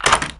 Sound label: unlock